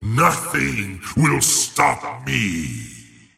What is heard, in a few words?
attack pain; razor